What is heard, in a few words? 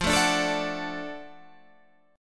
Gb Minor Major 7th Sharp 5th, chord